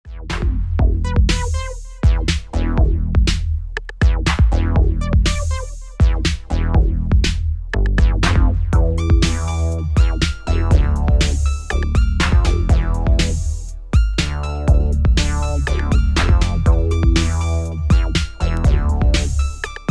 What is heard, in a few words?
Basslines